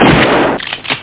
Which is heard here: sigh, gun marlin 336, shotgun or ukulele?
shotgun